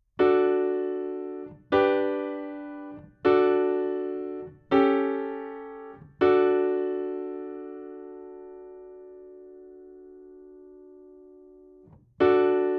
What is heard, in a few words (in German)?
Kadenzen in D Dur Übung